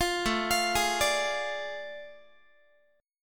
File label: A Major 7th Suspended 4th Sharp 5th, Guitar; AM7sus4#5; arpeggiated; 20,17,20,18,18,x